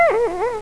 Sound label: headcrab die; xash3d fwgs